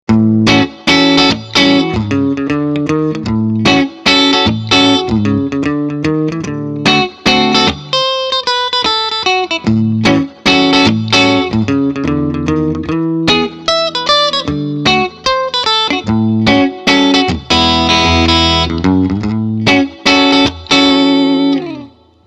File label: Gretsch G6129 Neck Bridge clean